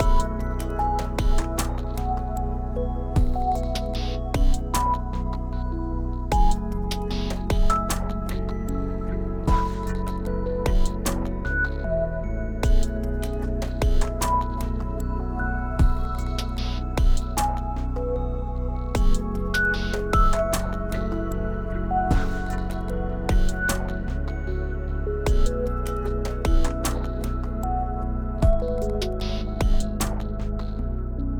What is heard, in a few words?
Exploration 8CH 76BPM